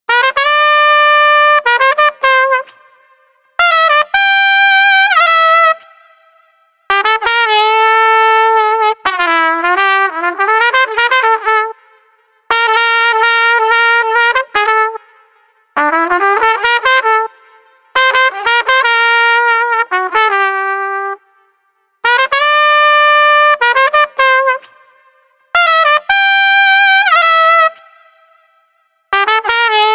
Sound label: Trumpet Thoughtful 110